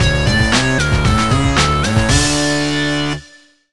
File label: Win, Clearing a level; MM&FaC; Fire Ride Fortress